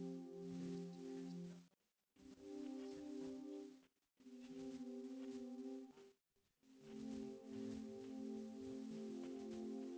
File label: wdrums inst src; SiSEC11; underdetermined